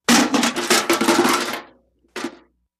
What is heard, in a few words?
Kicking Bucket; barrels buckets & cans